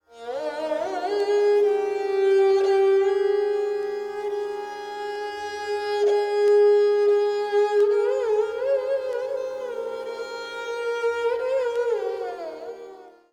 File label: dilruba; musique indienne